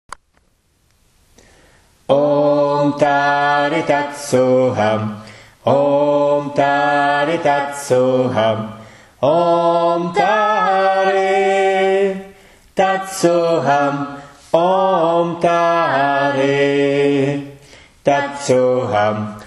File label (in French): chants sacres